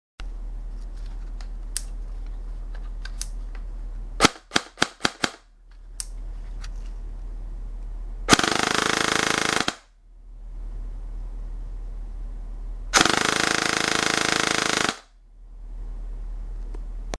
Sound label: m4crw sound, airgun